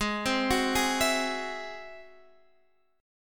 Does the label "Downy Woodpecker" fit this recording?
No